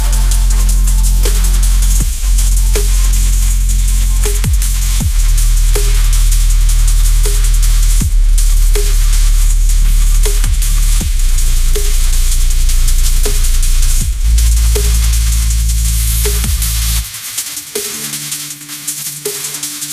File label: ringtone edm